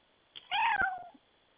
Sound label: cat meow